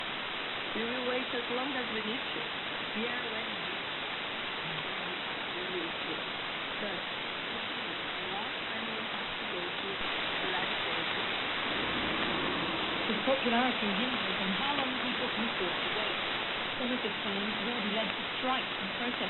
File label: 21630khz Acension; BBC; X One; AD370; Aktivdipol Stampfl